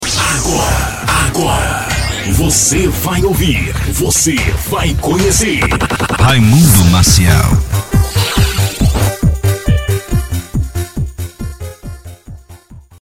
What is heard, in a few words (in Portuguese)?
vinheta